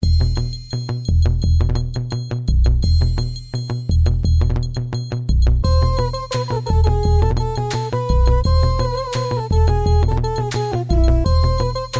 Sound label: arab pop